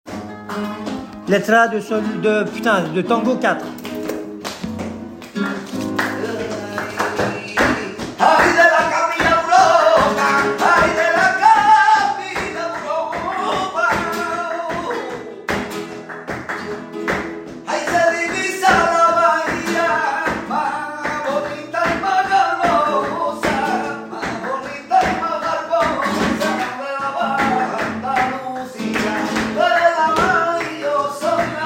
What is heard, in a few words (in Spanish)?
letra tangos